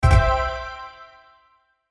Hit Stab Drums Shimmer Fast, Stab Dark Low Zap Reverb; Production Element Imaging Element Accent Transition; Stabs; Blastwave